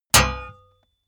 metal impact sword plate

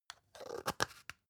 spice jar scratch close; industrie wirtschaft; gastronomie

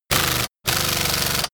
impact wrench use; industrie wirtschaft; handwerk

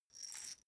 small glass pepper grinder turn; industrie-wirtschaft; gastronomie

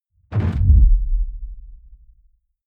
heavy sub noise bass

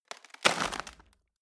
wood bing break; berufe; foerster

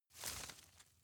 grab rustle short soft; berufe; foerster

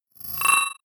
sweep rattle click; sweeps-swells

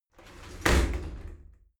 shower slide doors close heavy; objekte; tueren

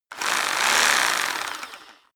rock drill hammer rev double; bohrer; soundpool

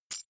key ring mid size catch; objekte; schluessel

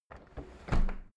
window handle close soft; objekte; fenster

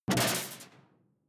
sci-fi alien bubble; swooshes-swishes

